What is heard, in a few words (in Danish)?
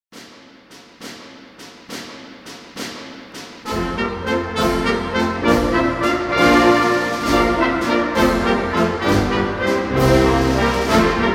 Gamle Danske March